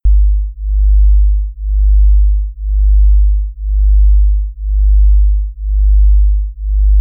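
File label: beat 50 vs 51 hz, Acoustic Beats; 7 secs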